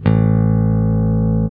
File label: bass